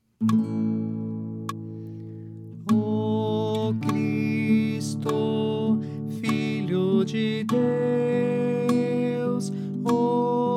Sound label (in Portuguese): Tenor